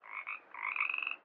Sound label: frog; animal